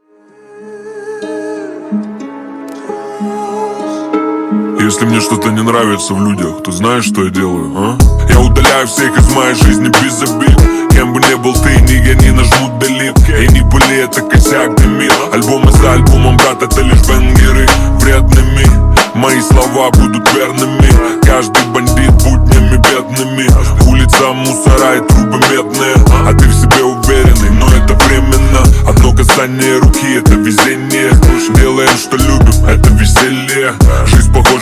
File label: Kriminalnyj bit